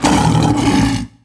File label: stygian lion hit; DAoC